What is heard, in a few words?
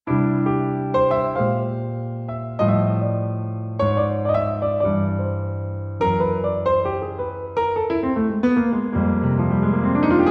Piano; Fantom XR